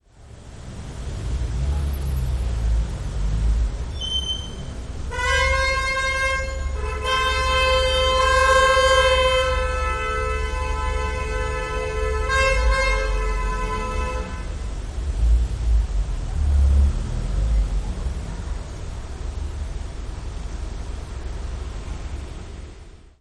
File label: Angry Parisian Drivers